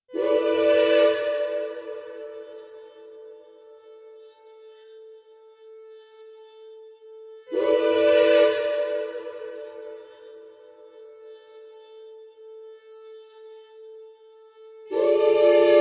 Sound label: fidolia vox